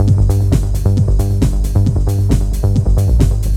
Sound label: e loop